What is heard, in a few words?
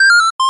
Flute; Yume Nikki